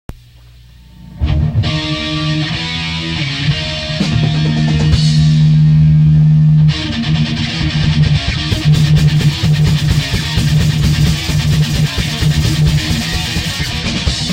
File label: Metall March Extream